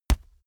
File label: boxing gloves batter hard